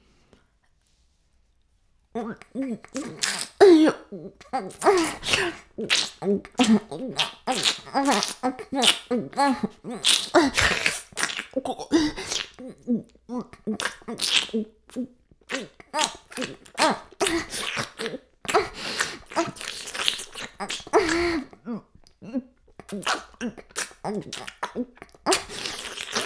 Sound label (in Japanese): フェラ音声, ASMR; 白くて黒い魔性円環